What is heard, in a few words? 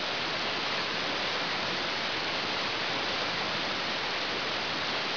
wtrfall; 12000 Graphics Pack - A Complete Graphics Collection For Your PC